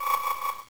beam; pokerogue

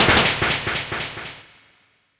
super damage; pokerogue

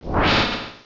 Razor Wind; pokerogue

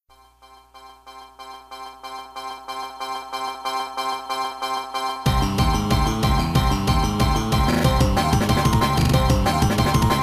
battle legendary; battle legendary lake trio; pokerogue

